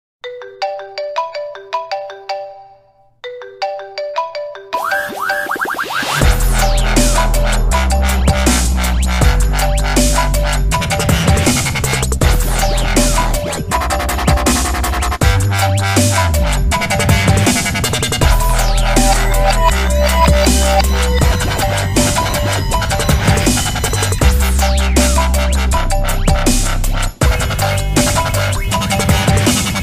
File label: iphone marimba dubstep remix